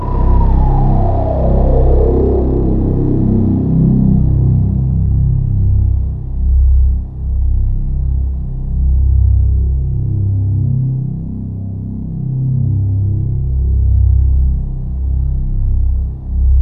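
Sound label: ETHERAL, Kurzweil K2000; BASIC-KW2000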